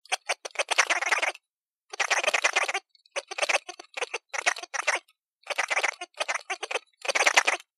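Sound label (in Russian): проигрывание кассет быстрой перемотке вперед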